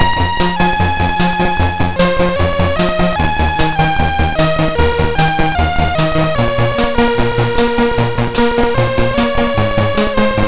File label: Synth Sample III, MOS6581R2